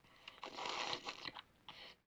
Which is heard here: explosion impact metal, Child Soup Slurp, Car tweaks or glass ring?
Child Soup Slurp